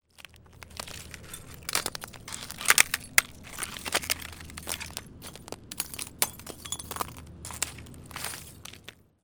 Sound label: BREAKING